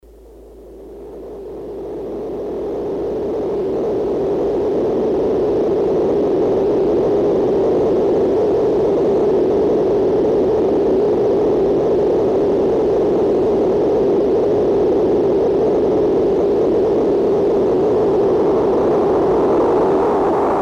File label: Wind, Korg DS-8; Factory Patches